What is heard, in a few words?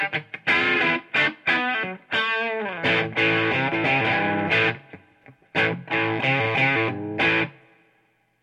Overdrive crunch